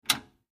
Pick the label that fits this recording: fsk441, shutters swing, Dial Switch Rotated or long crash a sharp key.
Dial Switch Rotated